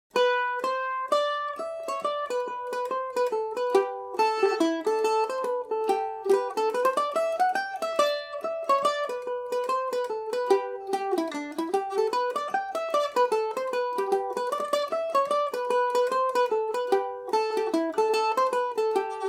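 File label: G major falder, irish mandolin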